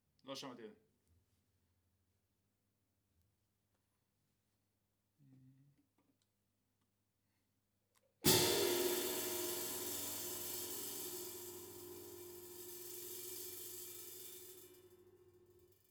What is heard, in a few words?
Ride